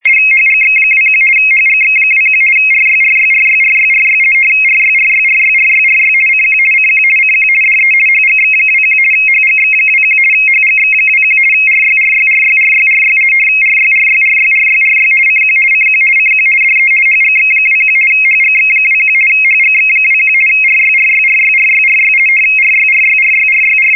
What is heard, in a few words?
budapest rtty 50 bd; mfa budapest